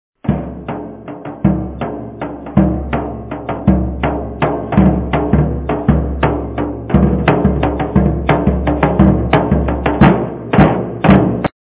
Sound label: dohol